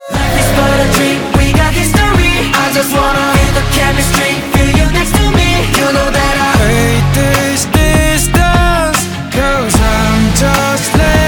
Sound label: Korean ringtone